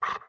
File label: Wolf Huff; Wolf Link